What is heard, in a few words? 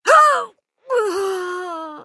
female die; firefight